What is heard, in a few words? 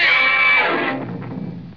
eeeeooooomp; Loony Tunes